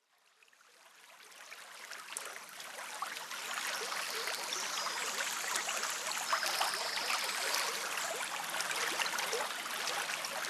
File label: Forest Morning Bird Babbling Stream and Morning Birds Sing